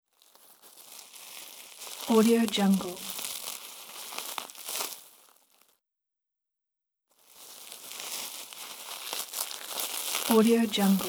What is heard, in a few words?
bubble wrap rustling